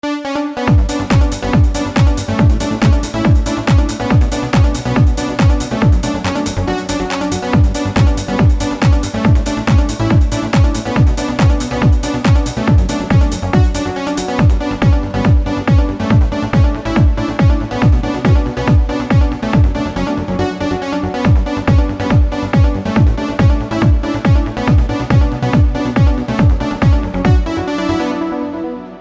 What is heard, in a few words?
TRANCE